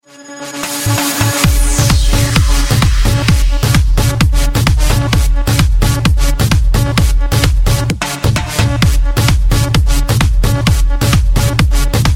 Techno

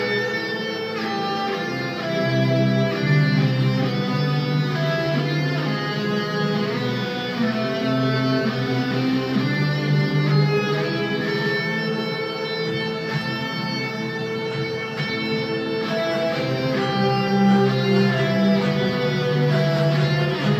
guitar theme

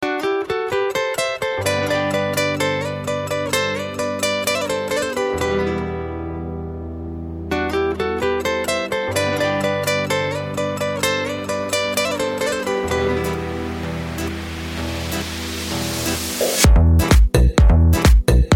Guitar Session

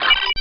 Switch

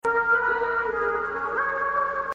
Singing Mermaid

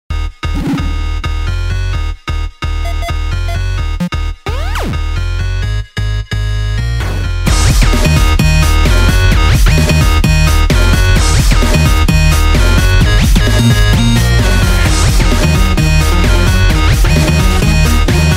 Boss Level 16 bit

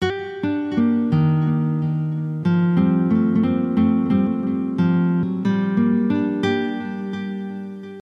Jingle

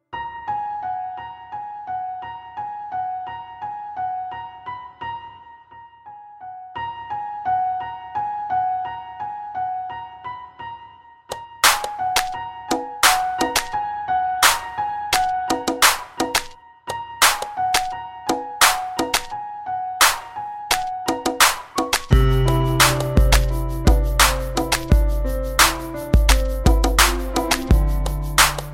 Piano Sent

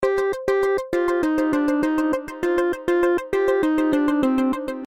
Message Sent; meloboom

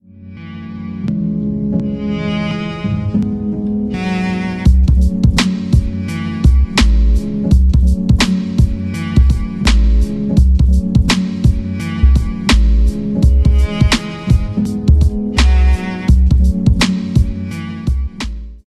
Old School Hip Hop

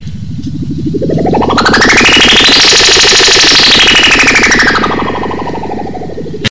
Sms Tone

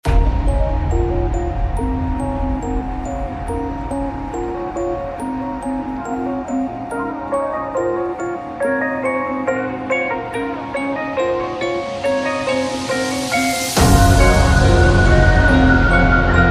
Falling Dubstep

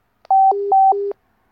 No Signal; Sepura

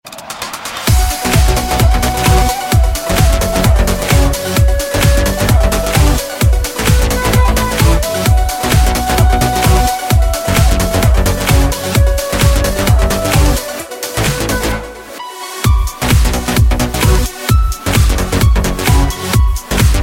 Softest

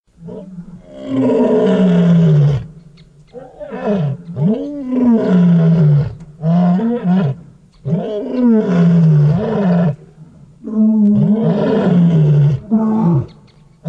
African Lion

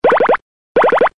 Korean cute

